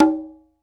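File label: HR16 conga high; Crypton - DrumBase5000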